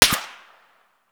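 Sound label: supersonic snap, bullet snap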